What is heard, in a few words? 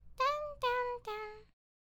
Cute Creature Humming